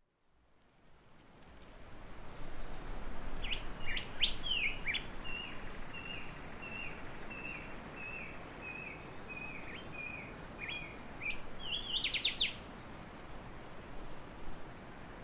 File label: A Rain Forest Dawn Chorus; Paperbark Cottage